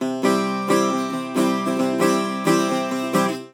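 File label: bouzouki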